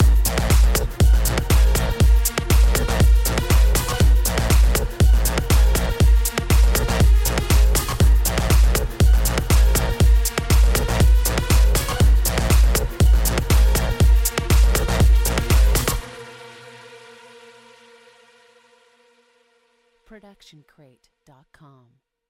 Logo Ident Trance Beat; SoundsCrate

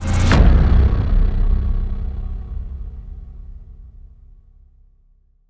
Bass Drop; SoundsCrate

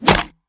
monster chomp; soundscrate

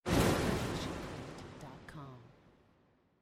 Spell Blast; SoundsCrate

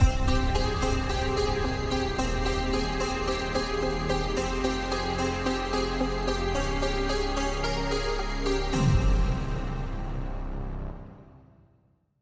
Mystery Ident; soundscrate

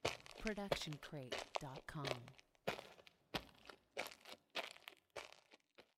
loopable walking on dirty ground; soundscrate

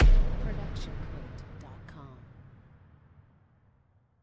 Epic Hit; soundscrate

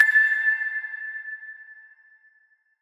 tonal blip; soundscrate

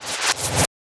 stylized glitch sound; soundscrate

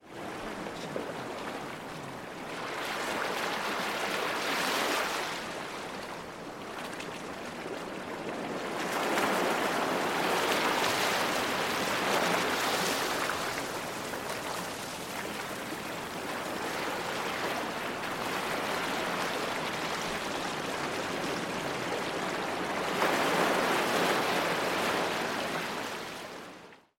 Beach Waves Ambience; SoundsCrate

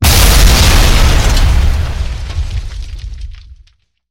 explosion boom; soundscrate

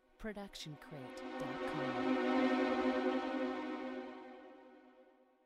tremolo strings mysterious; soundscrate

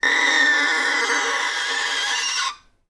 Creaky Screetchy Noise Long; soundscrate

